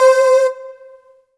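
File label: Yamaha TG100 Voice Lead C5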